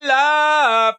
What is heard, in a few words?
ragga, stabs